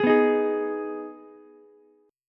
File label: G sharp Minor G sharp down Ukulele, chord; G#m strummed; JGuitar